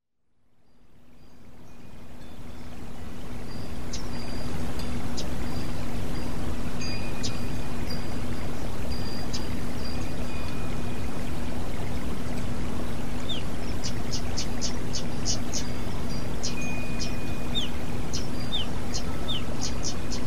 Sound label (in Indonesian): terapi alam decu betina